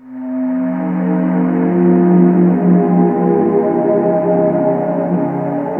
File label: DEEEEEP C2, Choir; USB Soundscan vol.28 - Choir Acoustic & Synth; AKAI